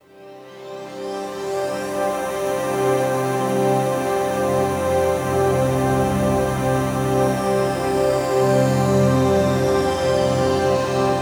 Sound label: Hi Complex, Complex Wave; Sonic Foundry; Sony Creative Software; James Johnson Spektral Minimalism